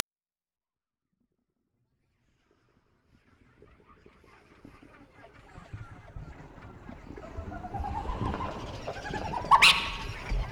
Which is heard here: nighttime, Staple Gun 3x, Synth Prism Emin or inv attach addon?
nighttime